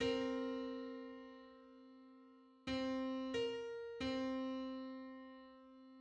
Fifty-seventh harmonic on C; 999.46 cents

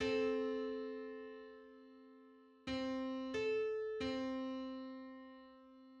77th subharmonic on C

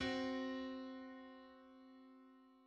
Diminished fifth on C

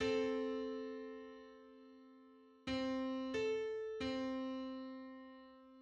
Four-hundred-twenty-ninth harmonic on C

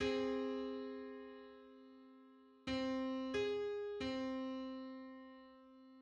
Eight-hundred-fifth harmonic on C

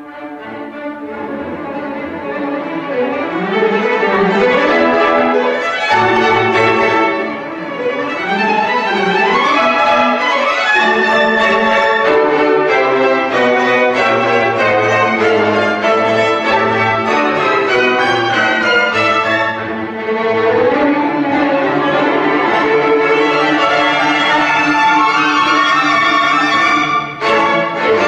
Quartet; Death and the Maiden

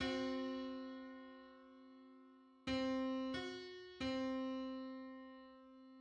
Seven-hundred-thirteenth harmonic on C

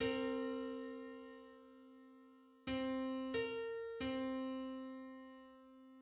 Eight-hundred-ninety-seventh harmonic on C

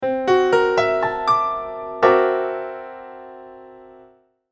Mystic chord

